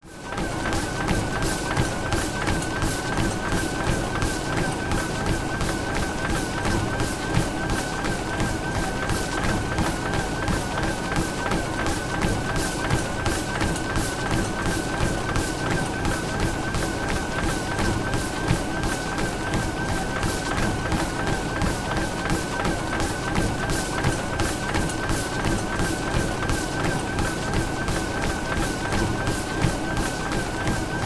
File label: treadmill running sneakers motor whirr